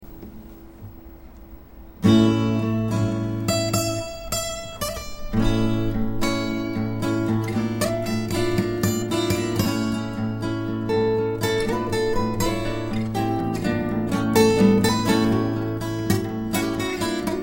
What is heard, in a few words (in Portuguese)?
felicidade melodia